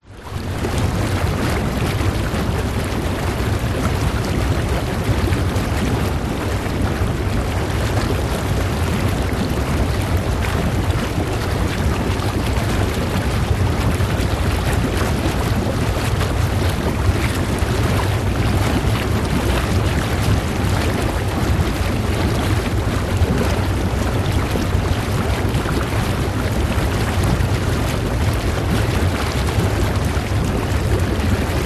public places spa jacuzzi water bubbles run long ortf; zapsplat